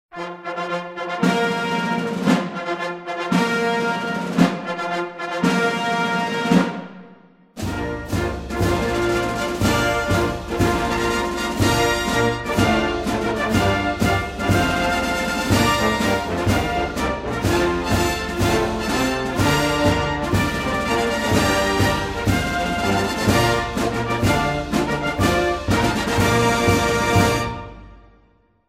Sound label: Ruffles and Flourishes General's March 3 star; Ceremony Music